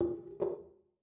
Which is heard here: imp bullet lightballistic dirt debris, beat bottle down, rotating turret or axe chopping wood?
beat bottle down